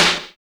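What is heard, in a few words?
snare drum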